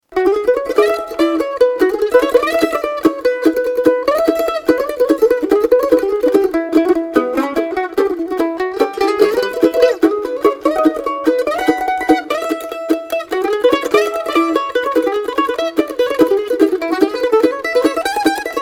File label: Mando